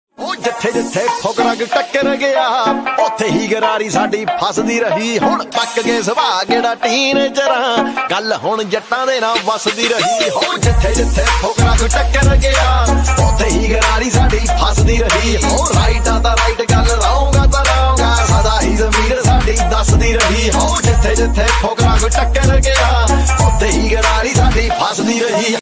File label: Desi Punjabi Ringtone